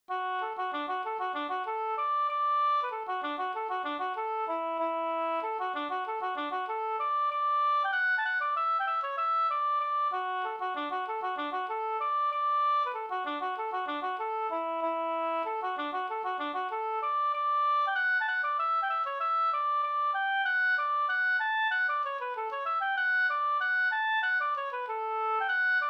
folkdans Rill med polsdans; fr Hogdal-Lommeland Bh

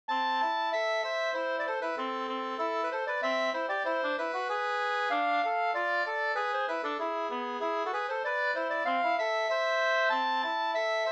jenkka; Harren jenkka fi 2st

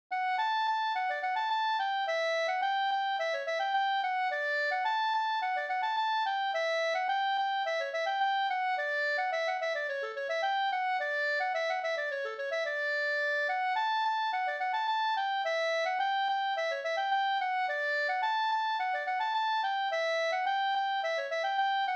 stjuls

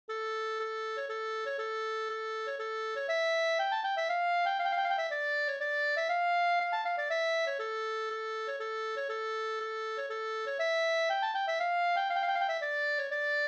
polska brudpolska Alvdalen

